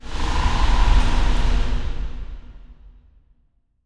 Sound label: continental planet; ambient; Stellaris